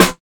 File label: short snare sample f key